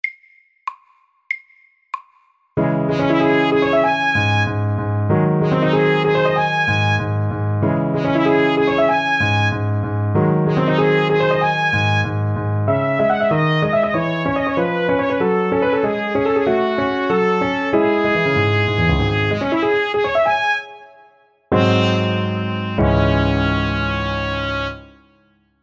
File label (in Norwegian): Prelude i Ess moll FORT